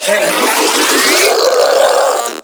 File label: Spin Scream; Enemies; Abilities; Warframe; Proto Infested Ancient